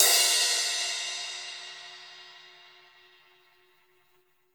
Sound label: Raw Crash, Drums Acoustic; USB Soundscan vol.10; AKAI; 90s sample CD